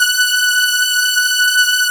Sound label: POLY SYNTH; Wizoo - Powered Wave